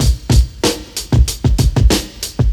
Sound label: synthetic syntheitc, Drum loops Boombap